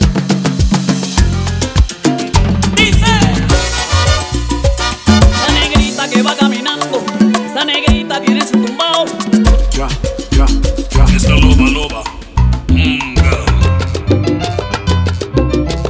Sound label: Salsa Vs Bachata